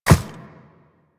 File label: Walk Ice, Footsteps; Warframe; Operator